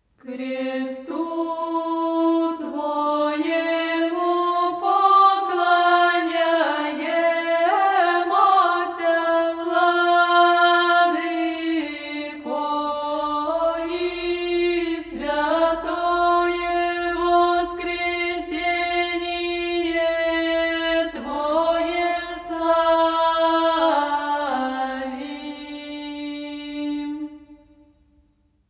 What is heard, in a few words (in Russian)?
ansambl znamennyj raspev; kljuch razumenija krestu tvoemu poklonjaemsja vladyko; znamennoe penie